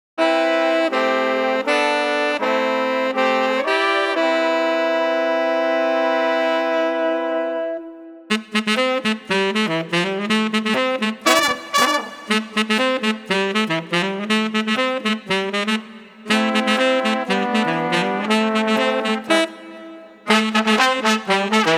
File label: Street Brass Ensemble Bebop 120